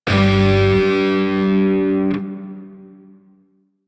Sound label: Shawzin Pent Maj Chord; Lotus Shawzin; Warframe